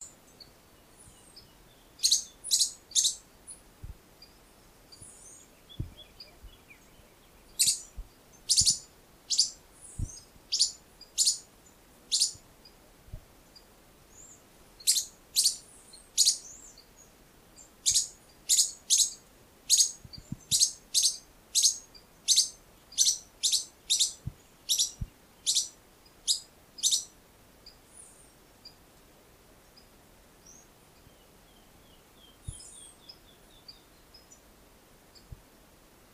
Canto do Arredio palido

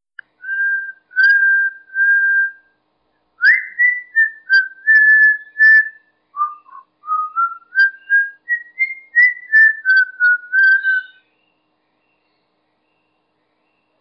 Canto de Calopsita

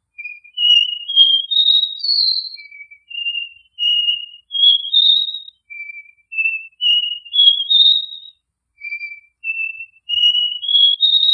Canto Caboclinho 6 notas

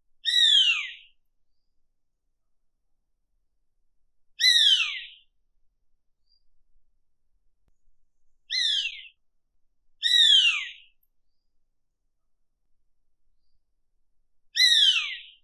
Canto do Gaviao pernilongo

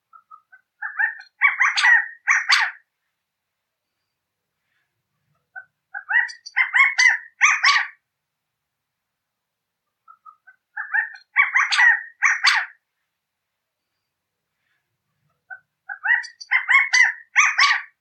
Canto da Codorniz da california

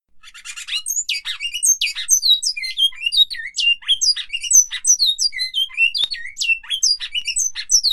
Canto Bicudo Flauta

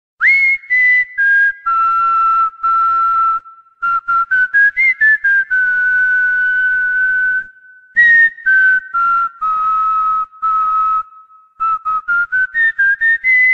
Assobio para Calopsita

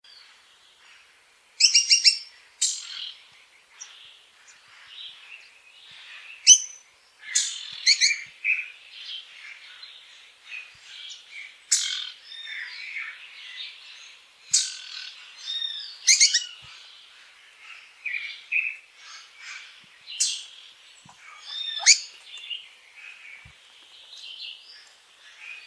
Canto do patinho

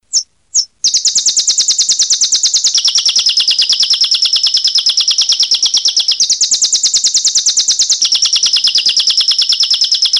Canto Canario da Terra Metralha 2 Notas

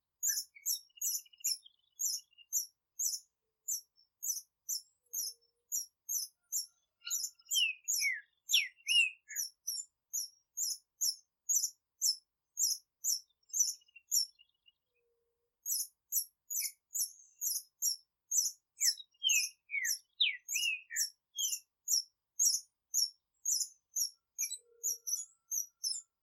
Canto do Rabo branco de garganta rajada

